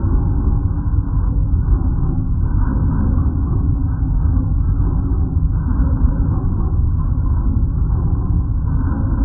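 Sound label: ambience mining facility loop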